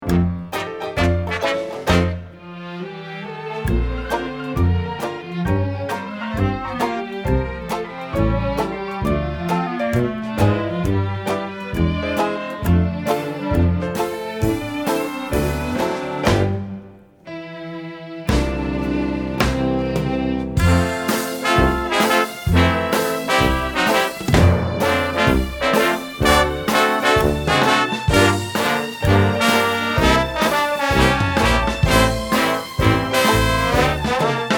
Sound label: karaoke